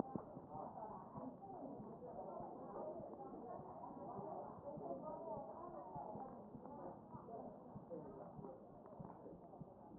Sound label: chest